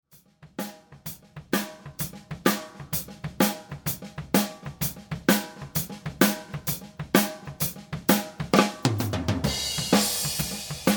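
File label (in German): Vgl Overheads MKH40 EQ, Overheads solo - MKH40 in ORTF - mit EQ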